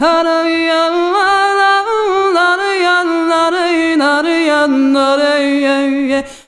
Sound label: VOICES; USB Soundscan vol.63; AKAI